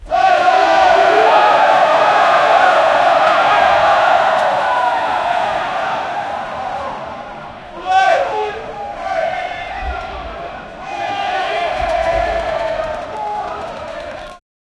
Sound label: basketball ambience; nba